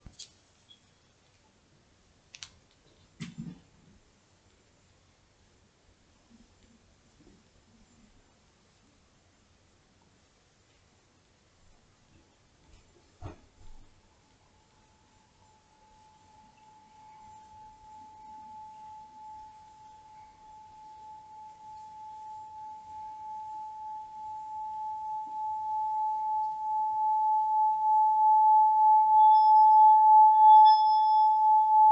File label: singing bowl